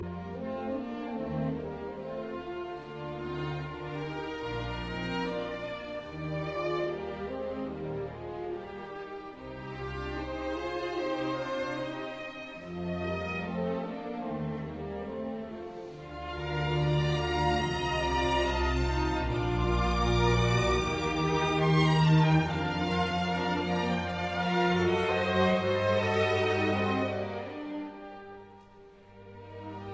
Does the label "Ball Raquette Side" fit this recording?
No